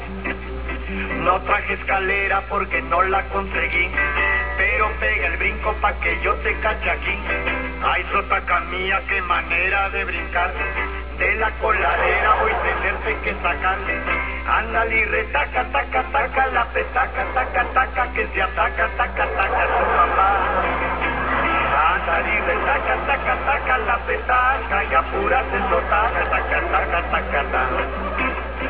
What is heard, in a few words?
Mariaches; chavo